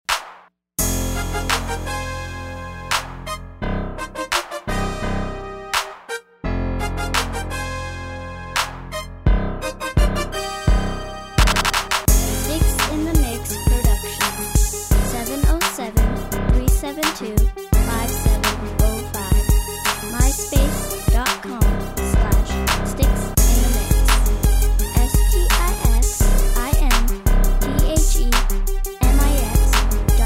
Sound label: krunk